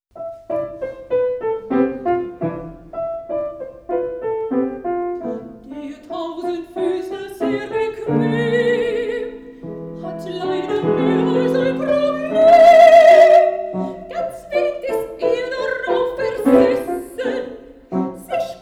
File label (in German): Lieder - Sopran, Klavier; Lieder Auswahl